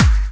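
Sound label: snare drum bass drum, Dance Mega Drums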